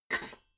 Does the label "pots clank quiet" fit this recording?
Yes